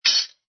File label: METAL DROP